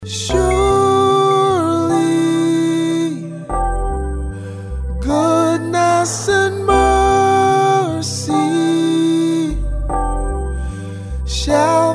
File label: ring tone